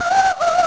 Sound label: Voice Choir; Genesis